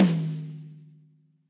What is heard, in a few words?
Tom Mid Bright Room; Drum Hits; Standard Hits; Ableton; Factory Packs; Session Drums Studio